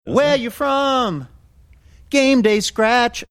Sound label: singing where you from game day scratch